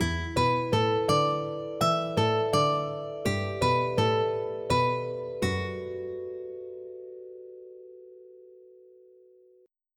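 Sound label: phase, techno bass drum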